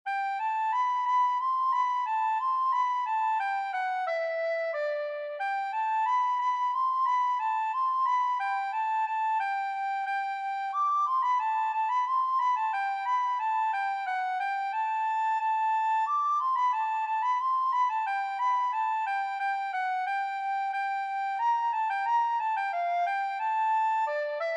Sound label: Descant Recorder; Horses Bransle